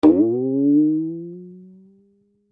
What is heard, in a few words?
Violin String, Violin String Pluck Ascend; Cartoon; Cartoon Music; Cartoons; Blastwave